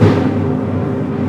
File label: G#4 thud; Northstar - The Wizard VOL-1; TYMPHONIQUE